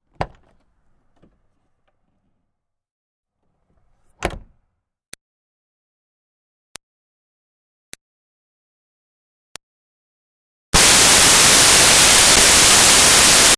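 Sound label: Car Smart Car Door Open-Close Light; 2005 Smartcar Fortwo; Vehicles; Big Room Sound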